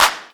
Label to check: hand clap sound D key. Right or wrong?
Right